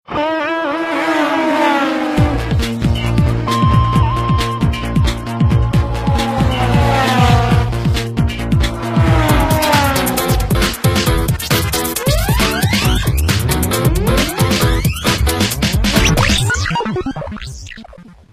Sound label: Cool Motor Droom